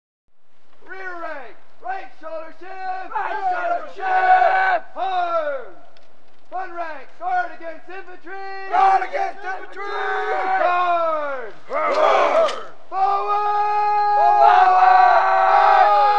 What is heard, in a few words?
Civil War Troop Angry